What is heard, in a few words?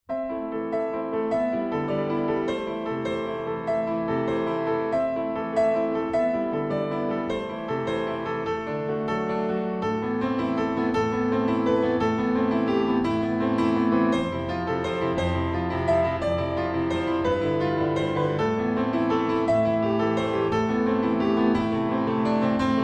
The Piano